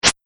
Swish - Ultra Swift, whoosh; Whoosh Sound Effect

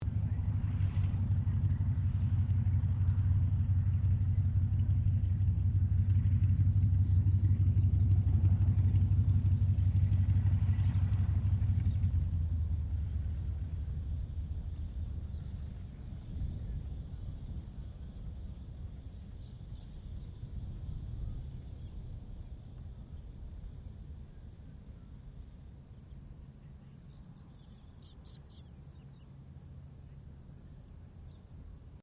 AIRCRAFT CA-28 CERES SHUTDOWN DISTANT; Airplanes

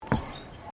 Footsteps Boots Suspension Bridge Wood Wire Step; Footstep Foley; Footsteps (Sound Effects:Human)

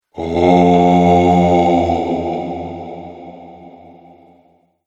Ghost Egyptian Phantom; Ghost Sound Effects

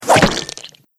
Sword Slash Into Flesh; Sword Sound Effects; combat

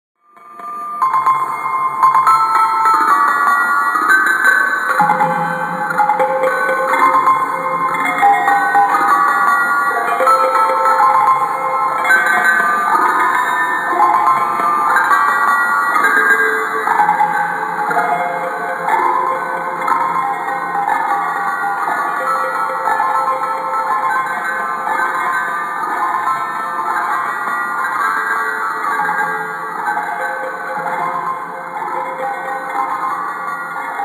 Disturbing Metallic Background Noises; Scary Soundscape Sound Effects; Horror Sound Effect

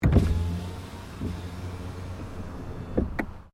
Car Ford Focus Window Open; Ford Focus hire car; Cars; Vehicles; SoundEffects-Foundation1

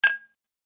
Wedding Champagne Flutes, glass

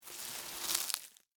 Scrape Ice Cream Cone, Debris; Texture; Scrape Sound Effects; BlastWave

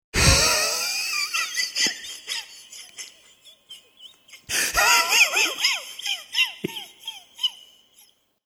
Wheezy Laugh, Wheezy male sly laughter; Voice

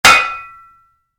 Spade hit, Shovel, Impact

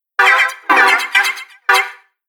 Robotic Noise Fragments, sci-fi sound effect

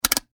Industry Dial Turn Switch Clicks; Factories

